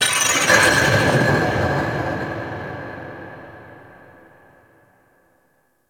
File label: Metal Impact Large; Warframe; Ambience; Cephalon